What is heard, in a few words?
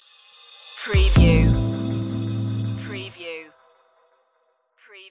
2 kords stinger